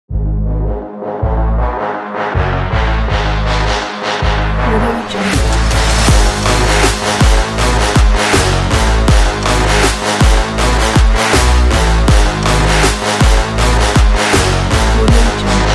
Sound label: power trap; chinomusic